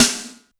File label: E2 - SD Rock Rim, Yamaha PSR-E323 Rock Kit